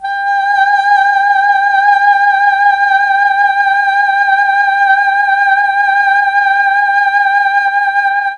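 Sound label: G3 female, chamber, mellotron; CHMB FEMALE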